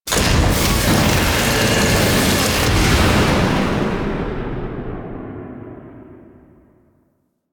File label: Forest Thumper Exit Ship; Warframe; Veilbreaker; Kahl